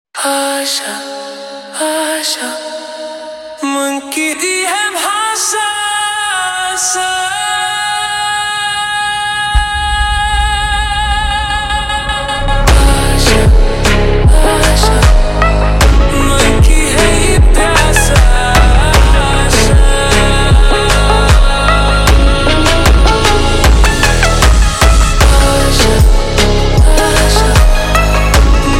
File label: super Slowed